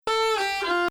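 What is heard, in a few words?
Bayati Samai Ajam 3-6, Arabic music; Samai Bayati il-3ariyan; 2nd Ajam 3/6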